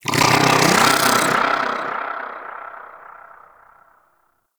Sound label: Bug Vocal Captured Lift Away; Characters; Warframe; Hunting Critters; InfKDriveBug